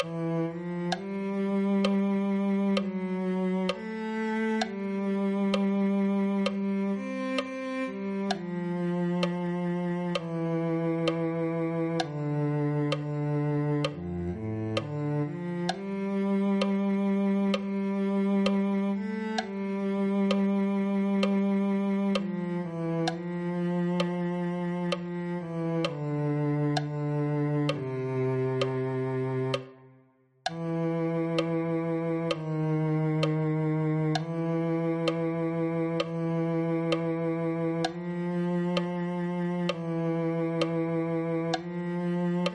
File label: cello